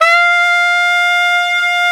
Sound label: SAX F3, SAXOPHONE; USB Soundscan vol.63; Balkanish Traditions; AKAI